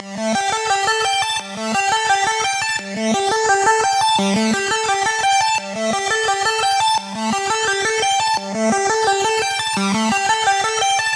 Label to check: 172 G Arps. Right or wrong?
Right